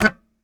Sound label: Very Short Note, Melodica